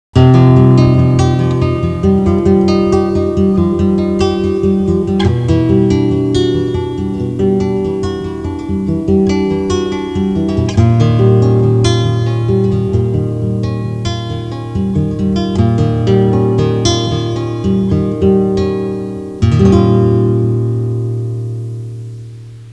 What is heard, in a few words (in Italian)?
musica strumentale